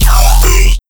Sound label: Sound FX Cyber